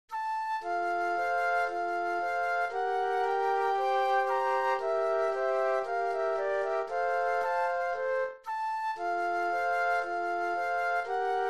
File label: Musette Flute Trio; classical; Gluck; Armida